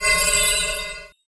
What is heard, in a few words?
King Slime